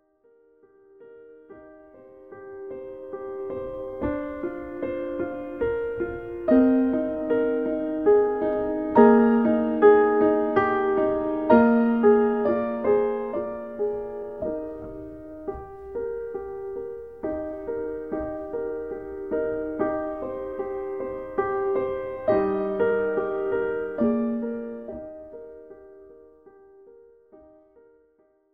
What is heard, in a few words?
HIGH VOICE; schubert